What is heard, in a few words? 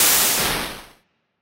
thunder magic small; 魔法効果音